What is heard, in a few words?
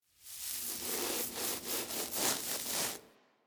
Kubrow Foley Interact Stand Scratch Foward; Enemies; Warframe